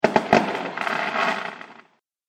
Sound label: Fireworks Triple Bang And Sparkles